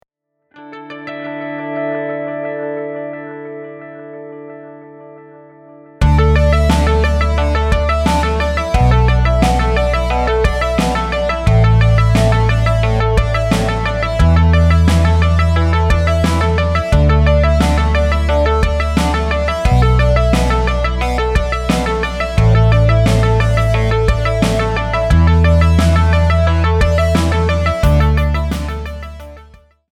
Sunleader 88bpm

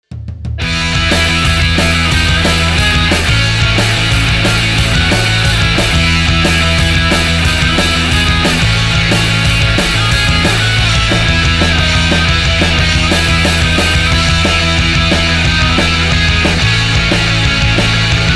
Highway 190bpm

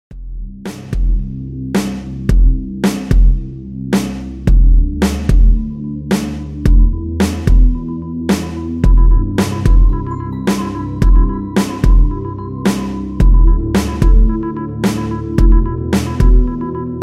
Nightgroove 110bpm remix

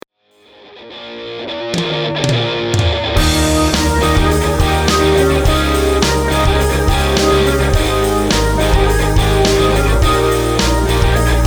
Getting Started 105bpm

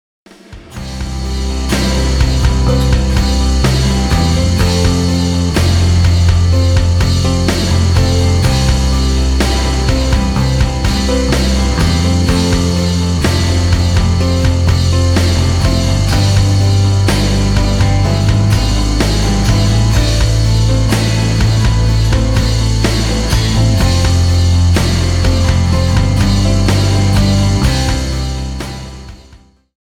125bpm remix

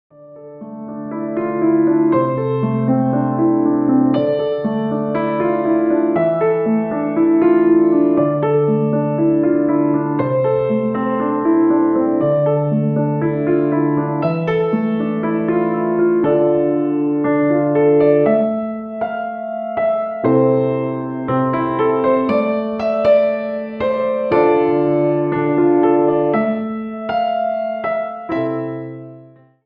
Bittersweet 119bpm

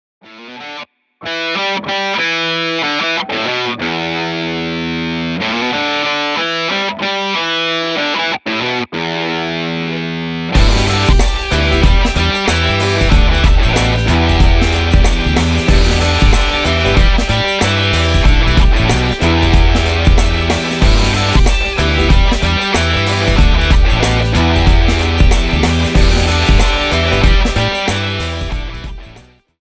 Swinging Hips 93.5bpm

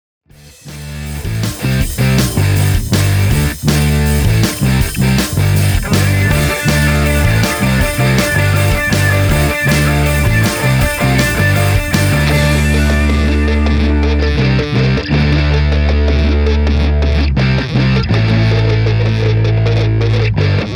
Lifestyle 160bpm

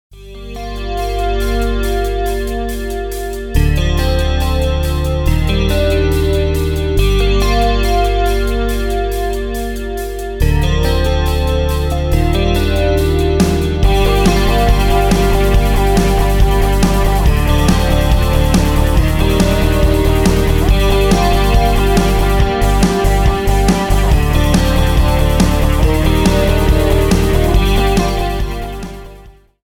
140bpm remix

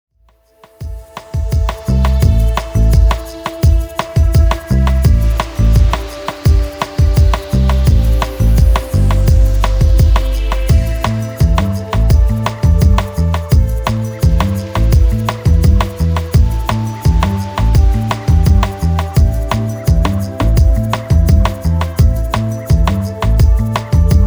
85bpm remix

